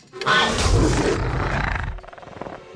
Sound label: raptor idle, raptor